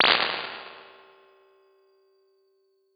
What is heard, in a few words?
Springs Short Spring, impulse responses; Ableton Live 12 Lite; Hybrid